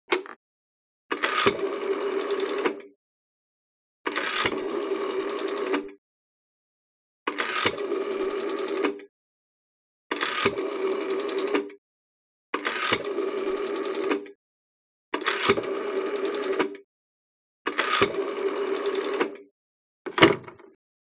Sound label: Phone Dialing Rotary, foreign